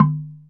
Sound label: Tongue Lo; Planet Earth; Digital Sound Factory Planet Earth